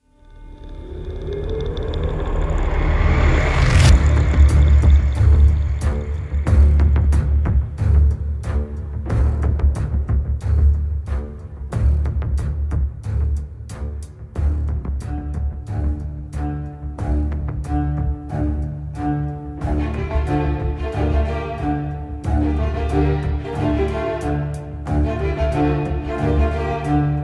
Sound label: electric string quartet